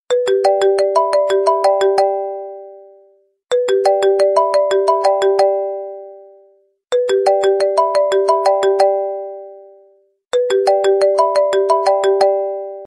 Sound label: iphone